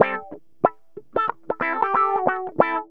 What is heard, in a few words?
GTR, Funky; Zero-G; Funky Elements